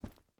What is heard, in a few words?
Backpack Impact Base